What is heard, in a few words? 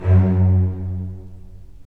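STR, strings; Roland - LA Composer vol. 4; Full Short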